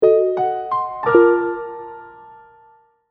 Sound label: logo